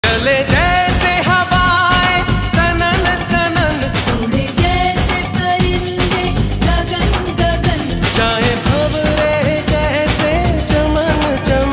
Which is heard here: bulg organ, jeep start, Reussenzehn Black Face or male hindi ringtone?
male hindi ringtone